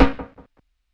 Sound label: SNARE OFF, DRUM N BASS KIT; JUNGLE BEATS